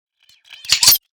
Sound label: Futuristic gun firing mode switch